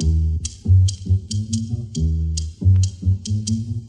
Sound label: bass, Loops